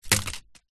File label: foley paper scrunched up small land wood; zapsplat